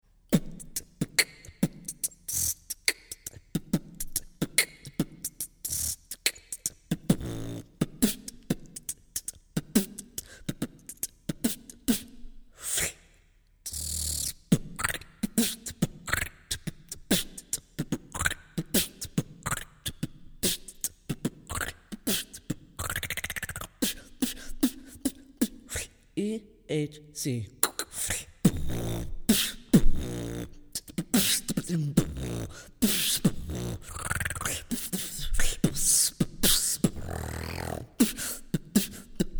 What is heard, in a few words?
beatbox